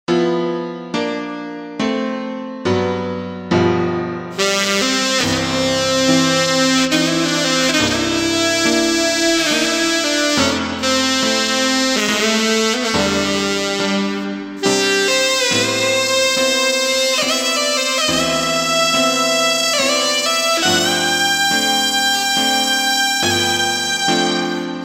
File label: Brt Tnr